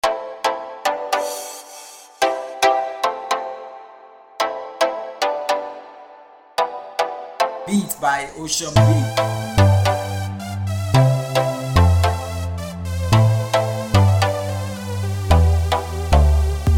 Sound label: Freebeat